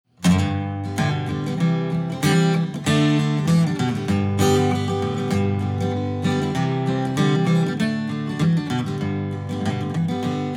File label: Up Tempo BPM 101 Male Folk Country Morality Song